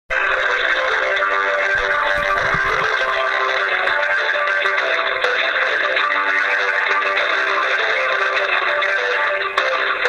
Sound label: on hold with first great western trains